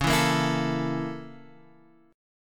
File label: A sharp Minor Major 7th Flat 5th C sharp